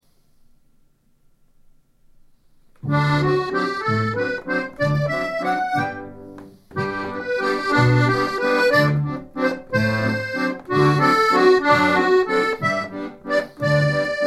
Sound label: waltz